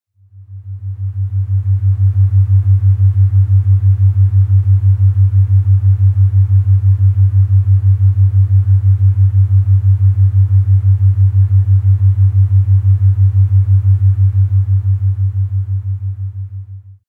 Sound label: Binaural Beats 6Hz Theta Wave Range; relaxation; brainwave